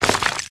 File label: ice particles; factorio